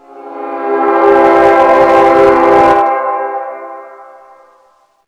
despawn; zerosiki; slender

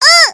pain sharp; spyro

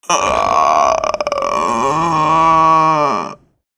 female zombie idle; nmrih; zerosiki; slender

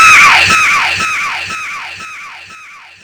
scare; slender

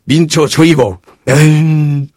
engineer laugh short

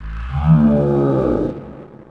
alert; slender; batman visional

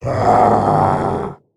alert, male zombie; nmrih; zerosiki; slender

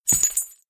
footstep; chriswalker; slender

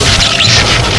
electrocute; billy herrington; slender

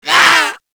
kid zombie stun; nmrih; childrenofthedead; zerosiki; slender

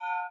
hit purple; zombie riot; btd

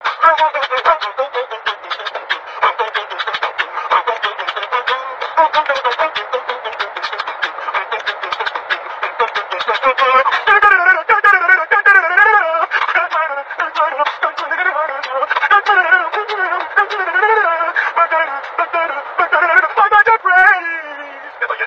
beatbox; freak fortress 2

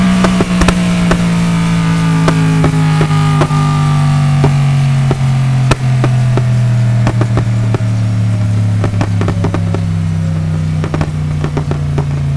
revdown; nfs heat ganimator pack

